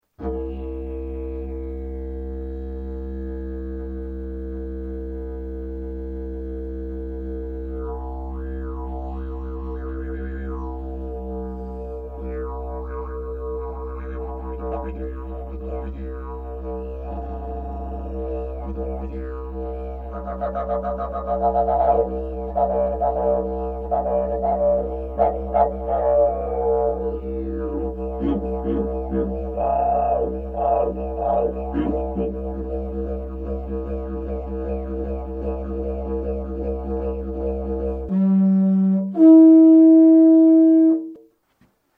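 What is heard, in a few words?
pvc C, didge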